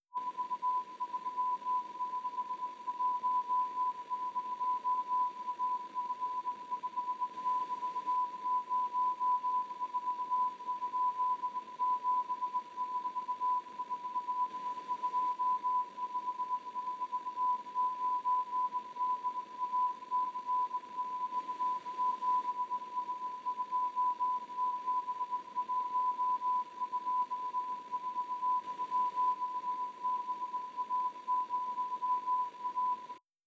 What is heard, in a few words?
morse reisolated